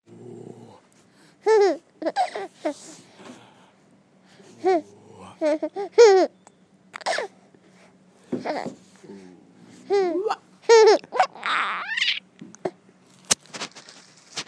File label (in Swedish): skratt